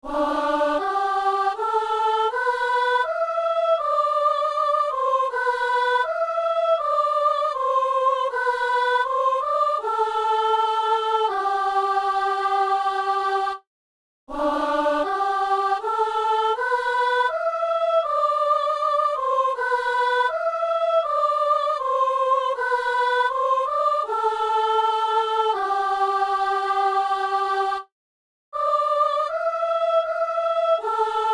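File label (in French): la voix qui crie dans le désert soprano; Saint-Denis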